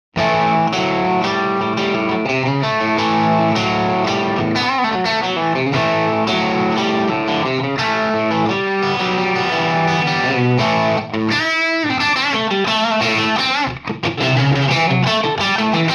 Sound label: strat marshall 100w